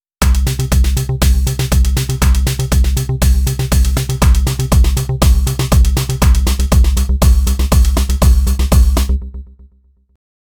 Unknow Jingle; Unknow Jingle Type 1

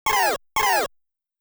Missile - Shot; Gaplus

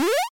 1-Up

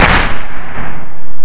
Clap; Guitar Rock Tour

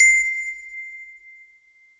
Bell

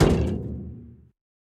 Weapon Metal Heavy

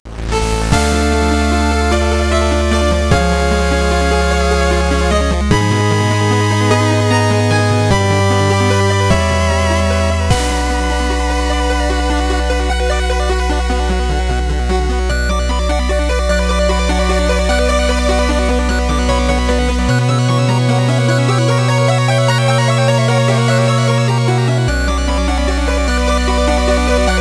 Stage Complete Nes; bloodrayne betrayal fresh bites

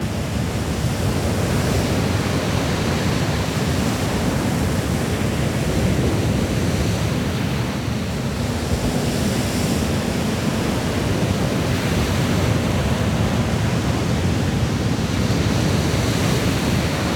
sea storm

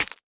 Weapon Bone Stinger

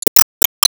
Team Skill Fullup

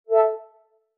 Move

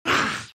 Shakey Kid Roar; The Binding of Isaac

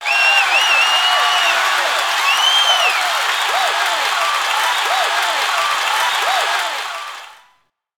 SHOUT; gamerip; PS3

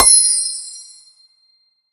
UI Magic Item Menu Select; Skylanders Cloud Patrol; Arcade, iOS, Mobile

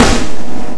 Snare (G#2); guitar rock tour

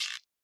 Task Notification Tiny

